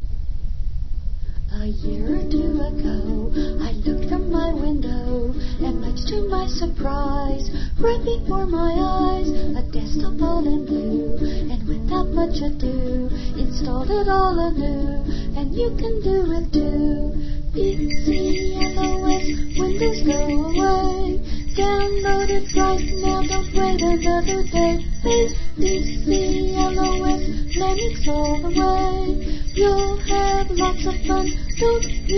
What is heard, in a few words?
jingle bells; pclos